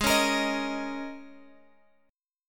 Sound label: Ab Suspended 2nd Suspended 4th chord, Guitar, Open F tuning; Absus2sus4; strummed; chordshape; 20,20,22,22,x,x